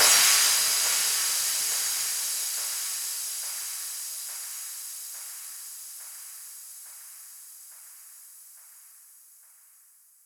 Crash 140BPM; Drums & Perc; Vengeance Samples; D minor

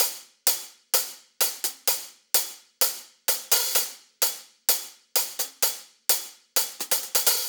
Real Hihats, 128BPM; Loops; Vengeance Samples

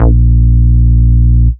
Root A#, Ultimate Bass; Vengeance Samples

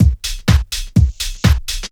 drum loop 125, heavyhouse; PS-28 Vocal House Samples; drumloops

